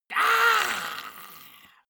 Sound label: monster growl high; schreie